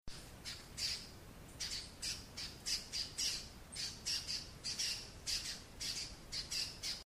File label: Nesospingus speculiferus El Yunque National Forest Puerto Rico; West Indian